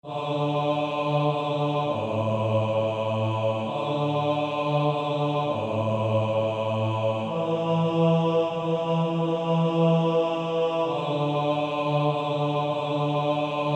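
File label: Male Choir, bass 2; Silent Night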